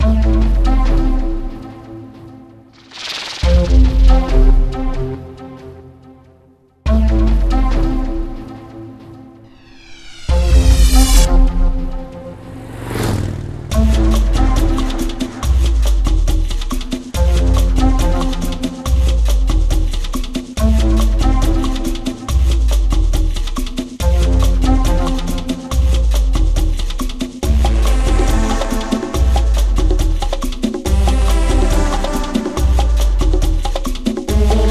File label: african drums; sarmacja